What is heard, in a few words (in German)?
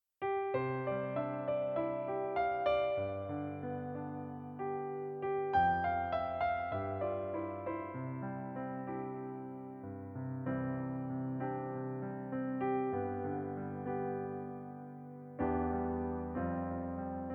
pianoplayback C Dur; pianoversion